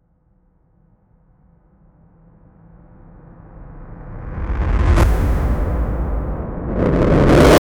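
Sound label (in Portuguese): explosao reversa; reverse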